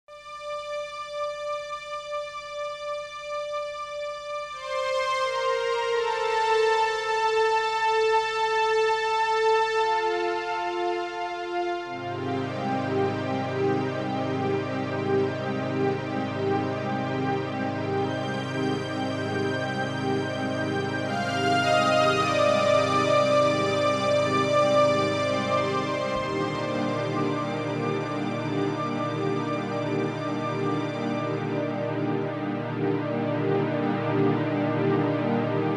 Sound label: Musiclassique; axiel-son